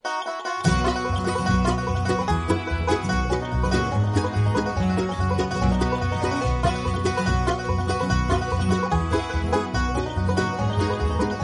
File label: funny musical cell phone tone ringtone; galaxy ringtones